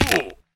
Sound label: fall big classic, damage; minecraft; faithless